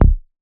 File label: Raw Bass D